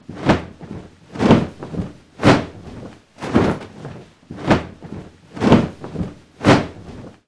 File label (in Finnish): matonpudistelu